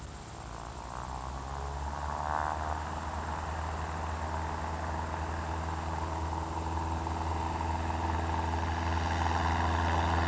prop; WAV Sound Effects; transpo; aircraft

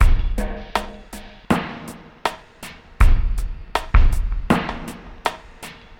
DRUMLOOP, AMBNT, 160; Ambient

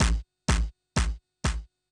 DRUM SHOT HOUSE 125; eJay; Singleshots

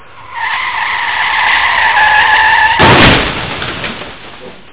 Car Crash; Amiga 8-bit Sampled Voice

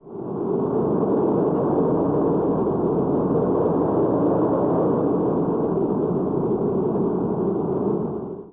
cave wind; DAoC

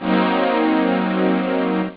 nuke, synth

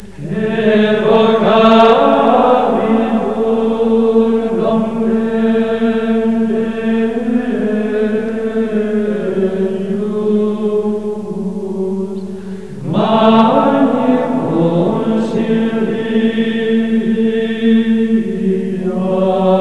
chant

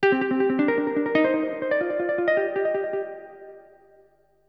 ELECGUIT, AMBNT, 160, C; Ambient; Guitar

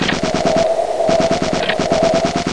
FAN; Piposh Special

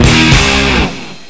distorti; drum samples

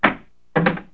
get item; Hellboy

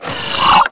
deactivate; MOTS

